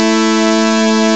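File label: synth, ANALOG; CMI Sound Library Rev 1.3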